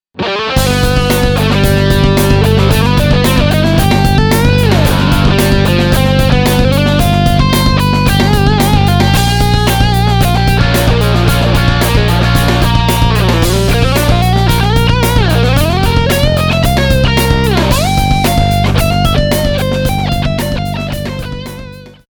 patch demo

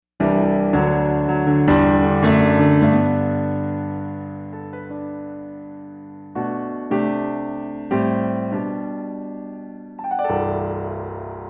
88 key grand; juno-gi

